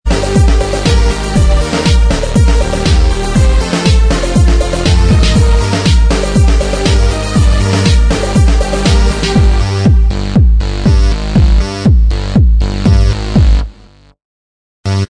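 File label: instrumental, backing tracks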